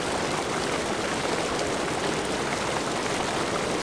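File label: waterfall medium loop; water splashes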